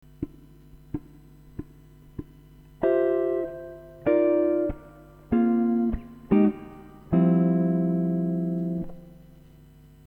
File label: Lick